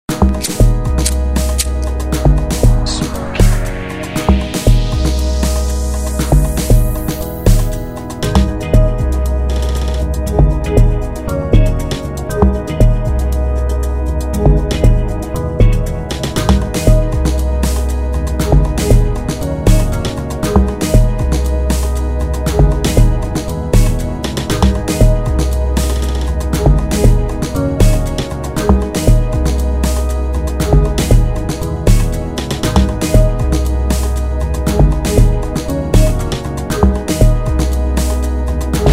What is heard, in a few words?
Afropiano